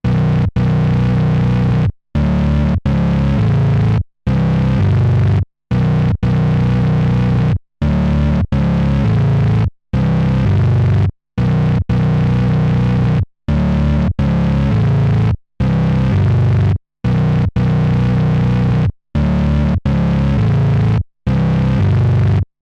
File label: Korg MS20 Ext In Bass Loop Max Distortion HP Resonanz